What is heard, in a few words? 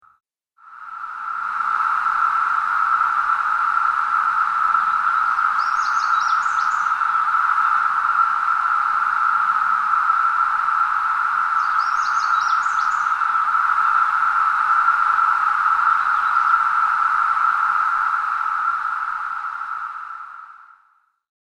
17 year cicada